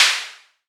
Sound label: Clap, Waldorf Blofeld